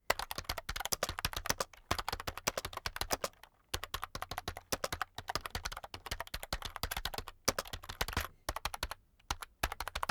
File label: keyboard external type sequence heavy, computer